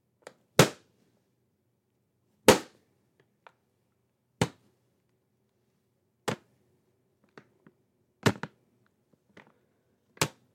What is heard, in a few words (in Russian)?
mnogokratnie udari po zhestkomu plastikovomu paketu polnomu styazhek